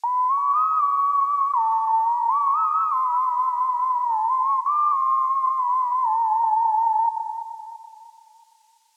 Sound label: Roland Cloud Juno-106